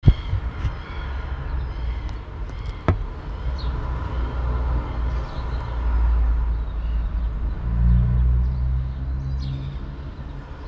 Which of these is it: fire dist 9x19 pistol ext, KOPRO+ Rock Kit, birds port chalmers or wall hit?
birds port chalmers